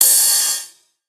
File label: Ride, Cymbals, Drum Shots; Psytrance; Singomakers; Psytrance Mantra